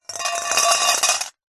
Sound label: candy boiled sweets unwrapped pour into metal scales bowl; food; zapsplat